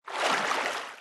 nadar

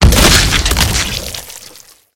caida

